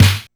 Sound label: Snare, LinnDrum